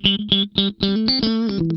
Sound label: Funk Guitar; 90 s Sample CDs; Zero G